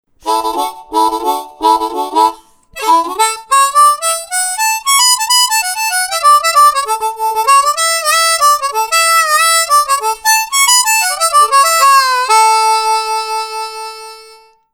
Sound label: Harmonica